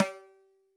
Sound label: dhin, mrid